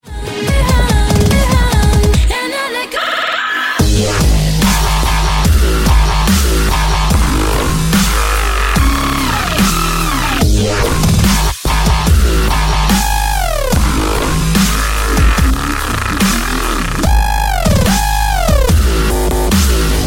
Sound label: crazy dubstep beat